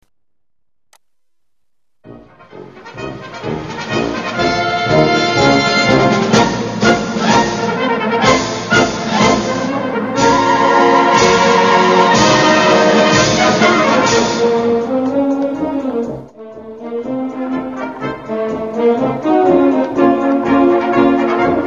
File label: ukraine military orchestra